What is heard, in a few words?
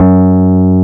PUNCHREEDS; Wizoo - Powered DX